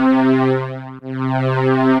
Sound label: Solina Cello Double, String Machines, ARP Solina; Ableton; Factory Packs; Retro Synths